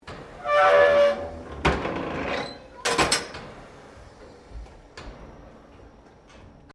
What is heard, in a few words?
Door 1909 Boiler Close, colonial era objects; Doors; 19 09 Thompson; Miscellaneous Doors